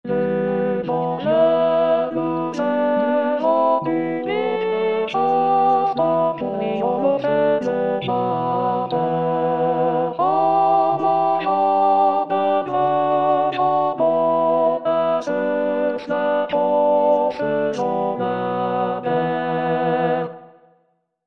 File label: tourdion